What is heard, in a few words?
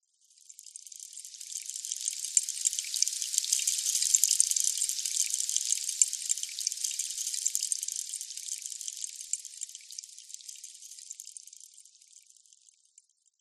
drink soda fizz